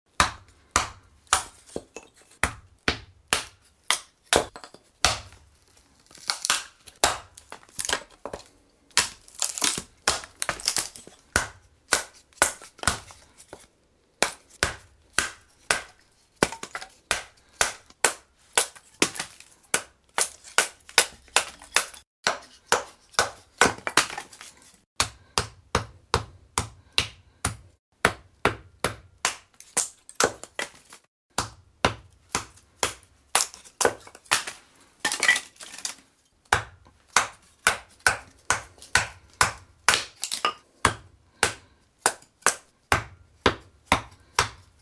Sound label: Chopping sticks for firewood; Axes (Sound Effects:Tools)